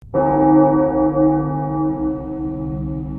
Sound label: Bell Chime